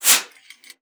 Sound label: PUSTE, BASSOON; Xsample VOL-6